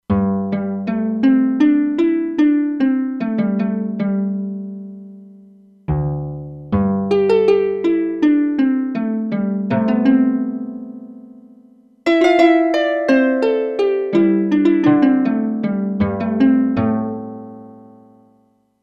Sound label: Koto, E-mu Proteus-2; Factory Presets